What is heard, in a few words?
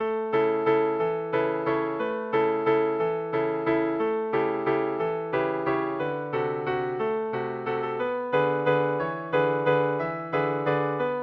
cheek melody